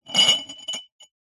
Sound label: household glass champagne flute scrape slide rough hard surface